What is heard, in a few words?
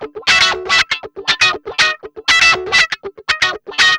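GUI 120 F#, guitar; Best Service Gigapack 2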